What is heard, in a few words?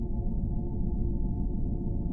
nopower, ships; CosmicRage